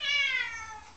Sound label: meow; space kitty